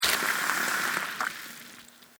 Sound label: absorbing water